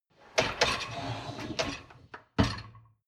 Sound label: dishwasher lower tray draw in